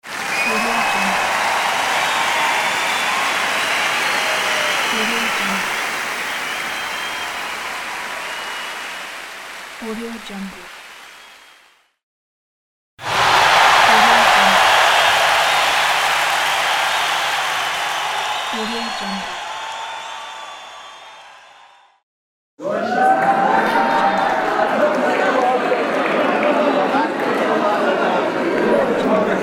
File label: crowd cheering sound pack